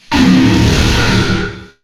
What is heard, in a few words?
death, player